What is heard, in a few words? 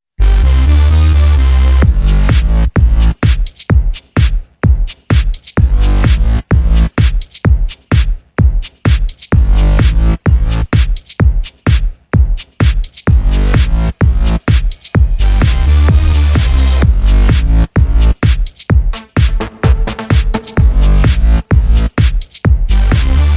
Music Bed, Am